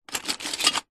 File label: toy lego movement many pieces in box